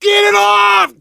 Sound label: scream while pounced, biker; player; survivor; voice